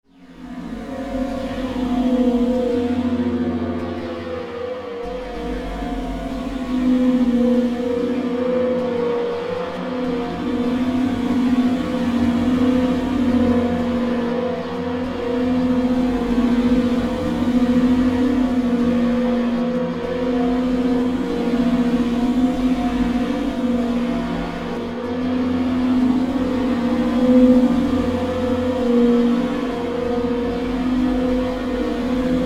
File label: Drone Dark Suspense Flange Swell; Blastwave